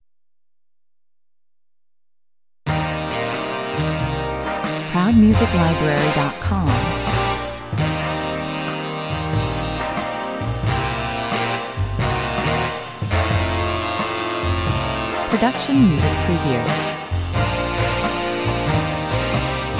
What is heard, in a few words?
baritone section trip